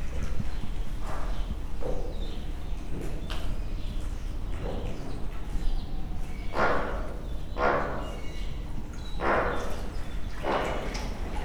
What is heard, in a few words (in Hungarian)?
a madárházban, törpe flamingó; debreceni zoo 2012